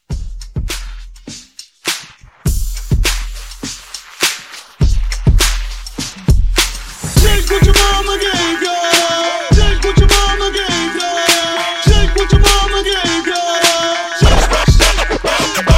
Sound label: Mixshow Hype